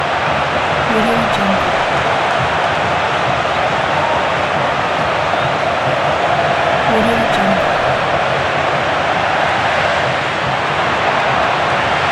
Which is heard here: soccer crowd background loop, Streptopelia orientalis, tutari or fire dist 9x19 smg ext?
soccer crowd background loop